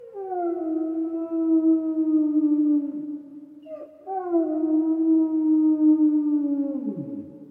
wolf howling in distance

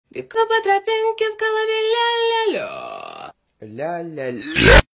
burps cutely

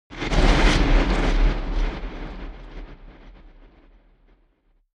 cinematic thunder; mixkit

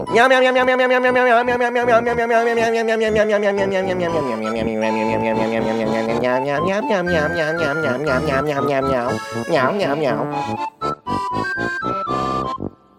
meowmeowmeowmeowmeow

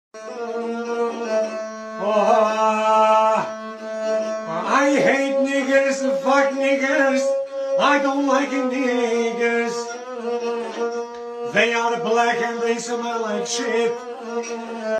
poor albanian man sings song

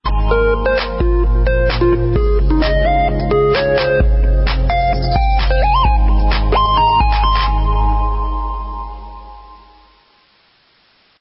ringtone

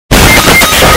death sound extreme bass boost; fornite

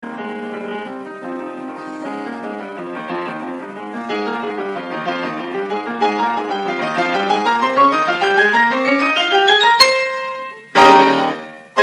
piano

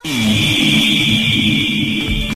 jumpscare

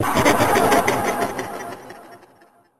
friend laugh

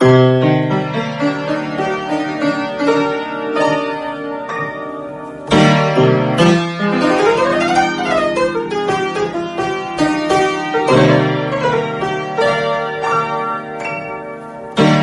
piano

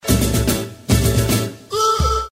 uhhh

